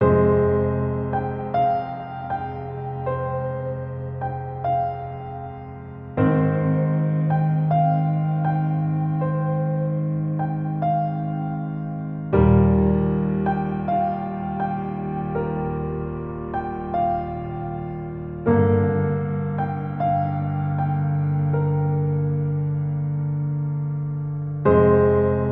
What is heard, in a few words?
Mellow Piano